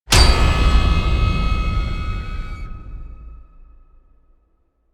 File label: Scary horror jumpscare